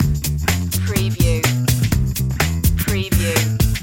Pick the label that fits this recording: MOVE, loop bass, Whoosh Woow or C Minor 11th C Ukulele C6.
loop bass